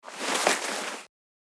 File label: Fabric material cloth shuffling